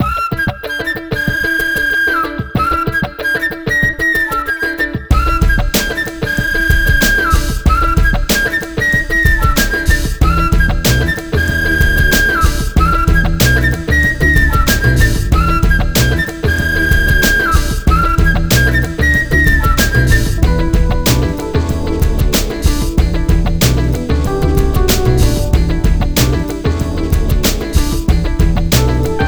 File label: lounge